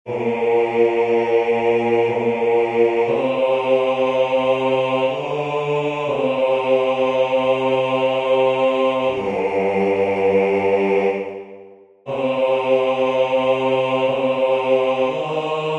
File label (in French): Basse, chorale; Graduale